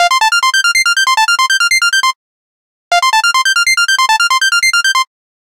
First Ring; Pantech Burst

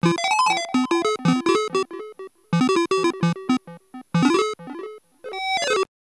alarm signal; Sony Ericsson; ringtones

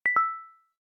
camstop, LG phonetones ui; LG X5 2018

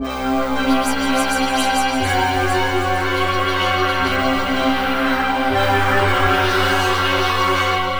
Horror; Sony Ericsson; PlusFourSix; VideoDJ

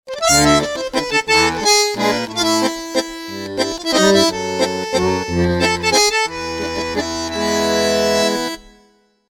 Twilight dance, ringtones

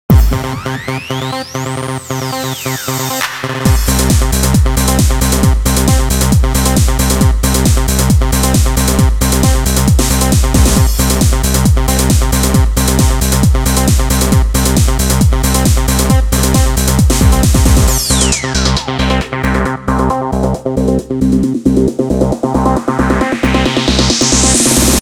Fat Beat; Sanyo SCP-6780 Innuendo

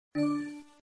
buddy alert small, Samsung phone system; SGH-T369 Linx; msn

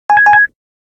Kiwi, Nokia Alerts; Windows Phone apps

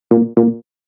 Bold beep; Nokia; 9500; Message tones

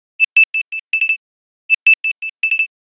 Green Alarm, alarms; Casper Via-M1

